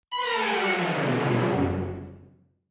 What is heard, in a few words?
fail sound; #-K- Elaina [Less Animation]